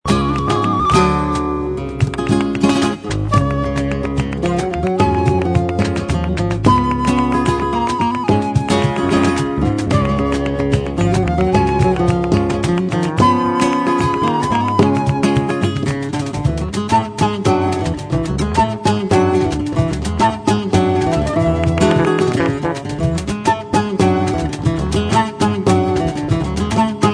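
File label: tapas, flamenco guitar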